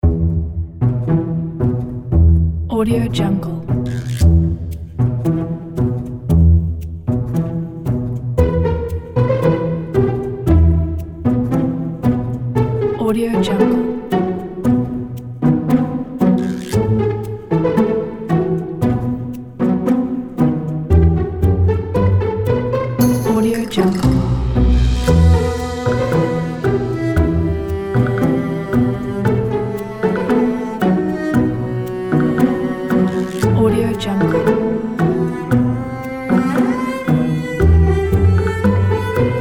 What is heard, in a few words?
tango; audiogong